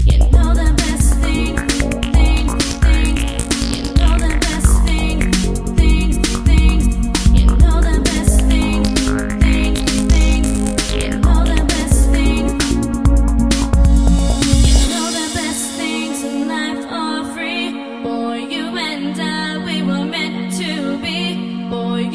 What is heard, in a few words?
car bass; ringtones; hip-hop